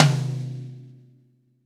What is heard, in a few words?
V-Pop Tom 47, Roland BK-5 Toms